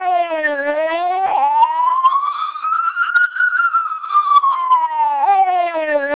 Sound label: siren, TWELP 700 bps vocoder; non-speech signals